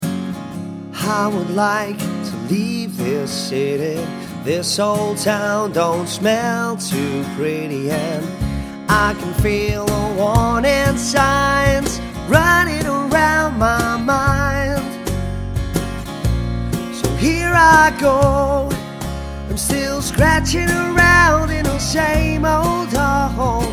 acoustic

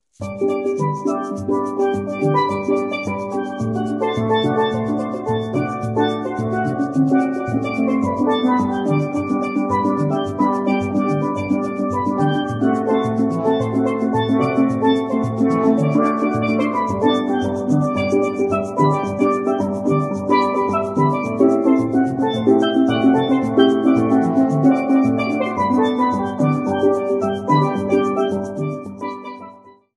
Steel Band

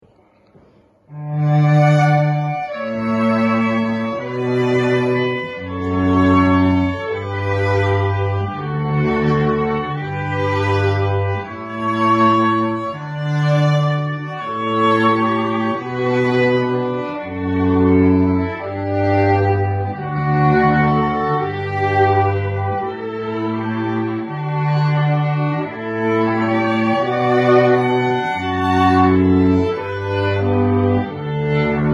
Pachelbel Canon acoustic

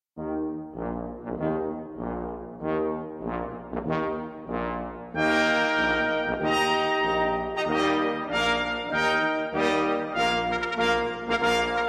Fanfare

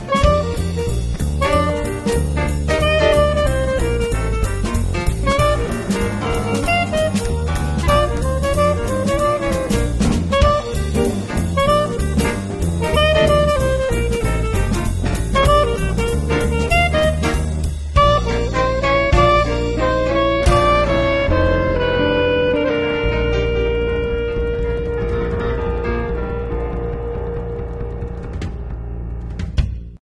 Blues

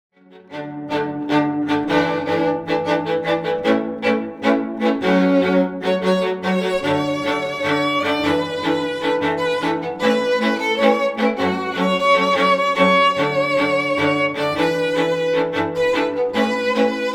cymbal quartet

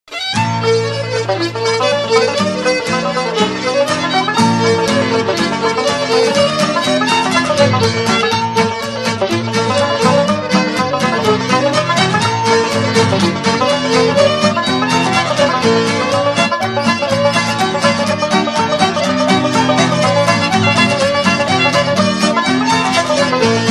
Scottish Reel

